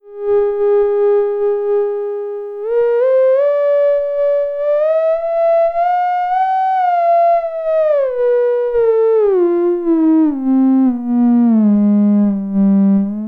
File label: opto-vco, theremin